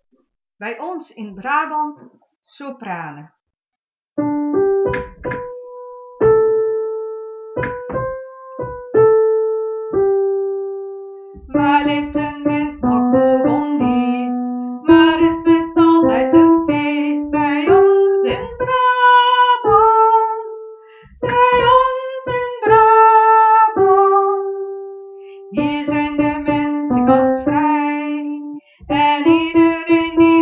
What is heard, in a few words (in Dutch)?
sopranen